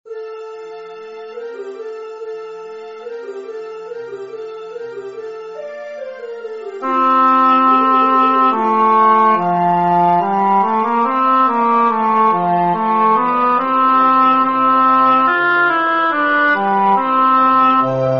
Tenor; G.B.Pergolesi; Laudate pueri

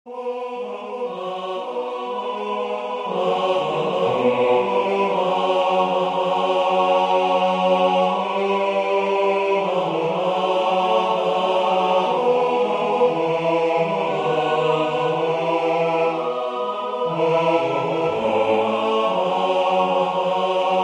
Nonne Bas; H.Schutz

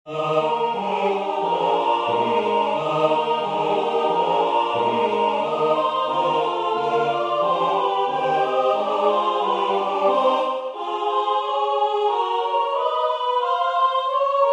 Tutti; A.Dvorak; V Dobrym sme se sesli

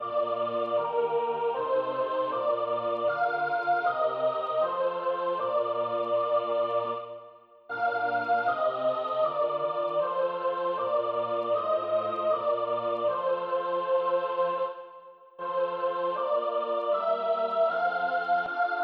Sopr.; C.H.Graun; Der Tod Jesu

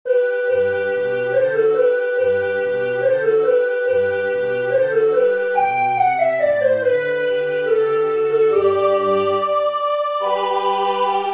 Tutti; G.B.Pergolesi; Laudate pueri

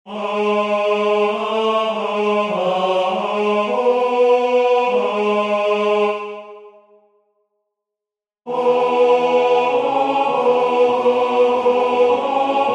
Tenor; F.Liszt; Crux ave benedicta

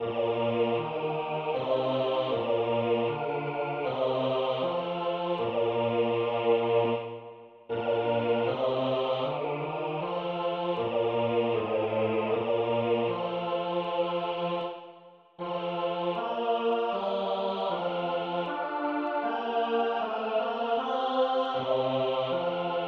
Bas; C.H.Graun; Der Tod Jesu

